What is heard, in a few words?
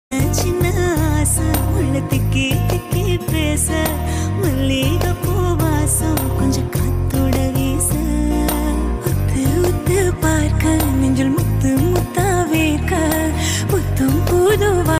live singing performance singer singing live performance